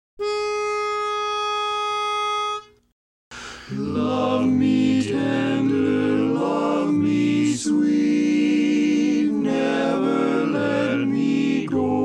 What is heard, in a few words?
Tenor Part Predominant, chorus; Enrichment Track